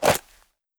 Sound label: magnum draw, weapons; tf2classic